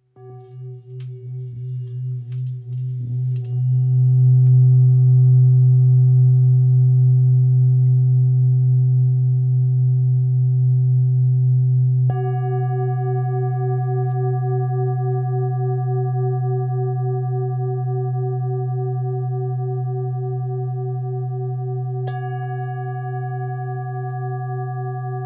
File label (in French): Bol bengale si 2.4-2.5kg